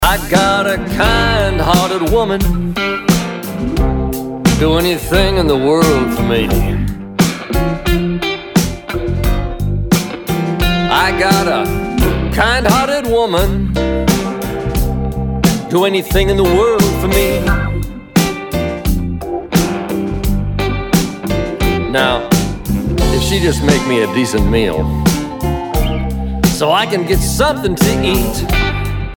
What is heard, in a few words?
Hungry Man Blues